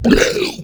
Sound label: boss lite sloppy roar